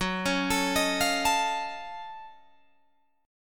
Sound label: Gb Minor Major 13th Gb down Guitar DADGAD; arpeggiated